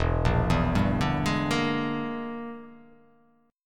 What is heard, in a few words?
E 7th Sharp 9th Flat 5th, chord